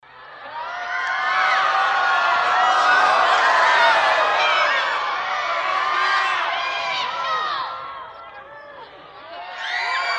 Theater crowd, horrified ooohs and screams in waves